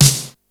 snare, Yamaha RY-8

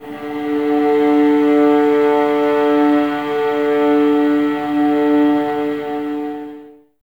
STR VAS D, Violas, No Vib; Miroslav Vitous

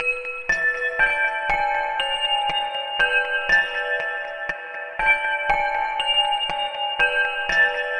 Silver Rod Piano Loop, Ethnic Instruments; Sonic Foundry (Sony Creative Software); The Electronic Music Manuscript Collection

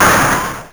Beatbox Crash (7999Hz); Sonic 3; M8; Genesis

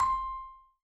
C5, Vibes; Sampleheads; Dave Samuels; Marimba & Vibes

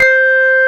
Korg CX3, F#5; Back In Time Records; Korg Universe VOL-1

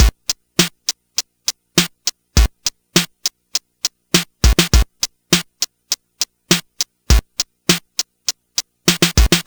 Game Boy Drum Loop, 8-Bit

